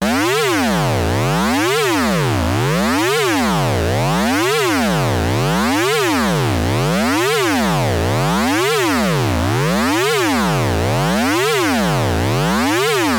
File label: Behringer K2 Ringmodulation LFO